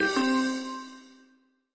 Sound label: illegal move; freecell solitaire